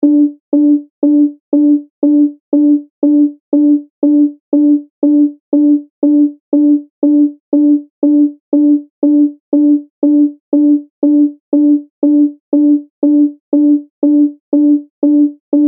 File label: Alarm Loop; electronic